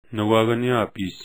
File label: snare